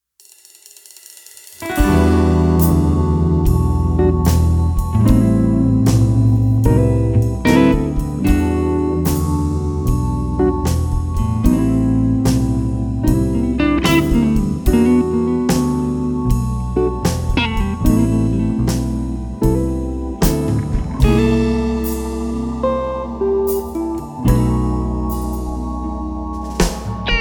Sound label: blues